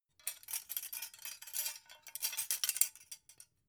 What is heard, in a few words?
dough hook handle; industrie-wirtschaft; gastronomie